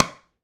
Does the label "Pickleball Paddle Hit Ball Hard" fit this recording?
Yes